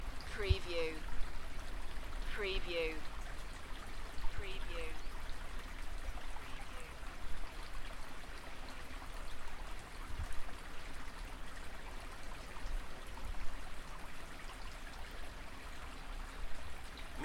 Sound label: Welsh stream; beanstalk audio